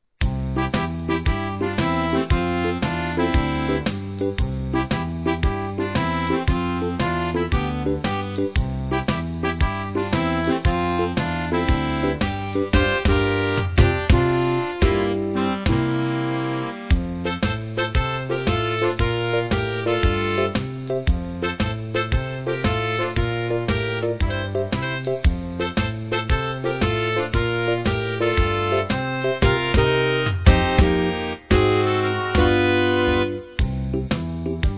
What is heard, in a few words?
concert band; plats combinats